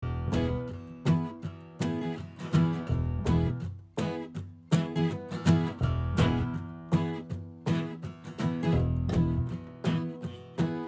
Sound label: Acoustic